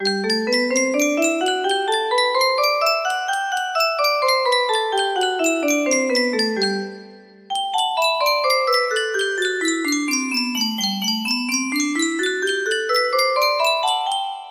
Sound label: g major, music box